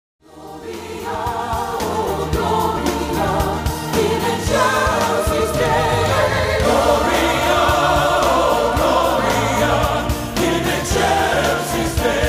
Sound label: orch track; Word Music